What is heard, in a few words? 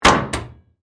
Metal Hatch Close, Scifi, Metal, Hatch, Close, Rocket, Ship, Door, Science, Fiction; Spacecraft Sound Effects; BlastWave